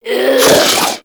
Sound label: Infested Diseased Ancient Breath; Warframe